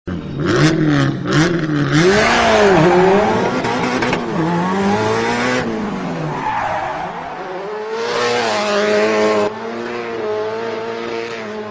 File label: Drifting; Ken Block